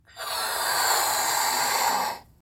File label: balloon inflate